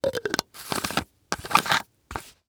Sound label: Household Jar Screw Lid Plastic